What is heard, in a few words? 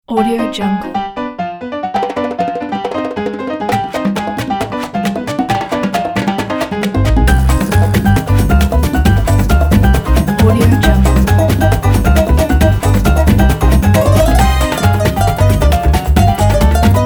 salsa; jefflloydmusic